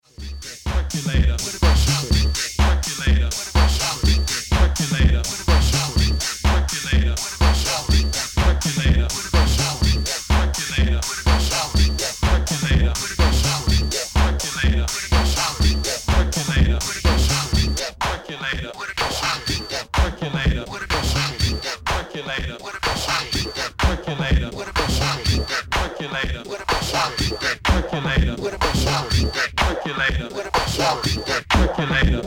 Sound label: beat that perculator